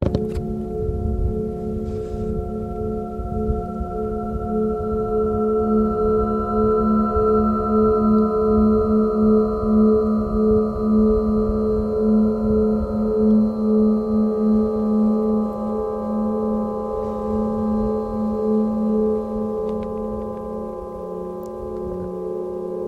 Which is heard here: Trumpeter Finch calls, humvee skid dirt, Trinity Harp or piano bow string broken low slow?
Trinity Harp